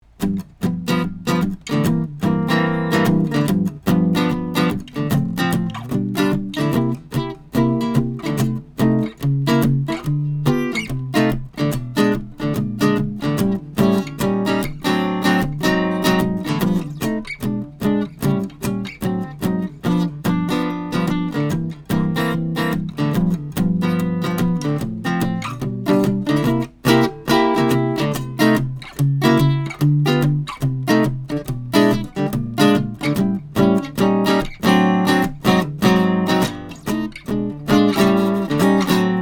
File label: Royal Crest Jazz; sE RN17; Trident 88 console; Metric Halo ULN-8 converters; 1938 HARMONY ROYAL CREST ARCHTOP